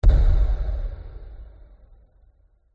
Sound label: sound design hit impact thud low pitch reverb flanger